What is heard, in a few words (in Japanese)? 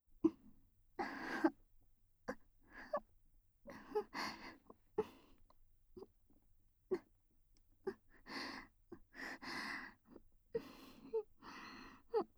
クール喘ぎループトラック, ASMR; 事務的ビジネスエッチ; クールな奴隷エルフ姫とのビジネスエッチ→ラブ堕ち性活2～二人きりの新婚旅行であまあま天国♪